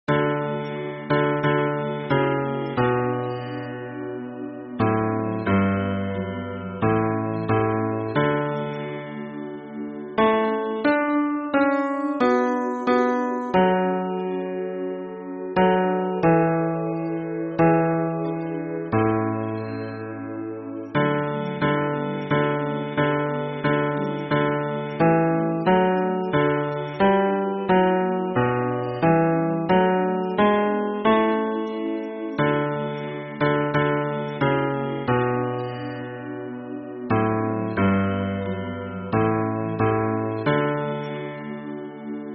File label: hymn bass